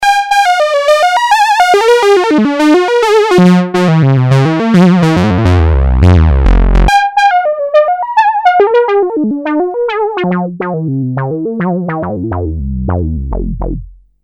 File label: saw solo